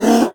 ogre hit; Crypt of the NecroDancer

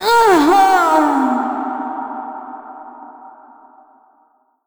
death; Crypt of the NecroDancer

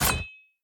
spike trap; Crypt of the NecroDancer

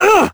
hurt; Crypt of the NecroDancer

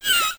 bat hit; Crypt of the NecroDancer

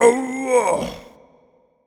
blues king attack; Crypt of the NecroDancer

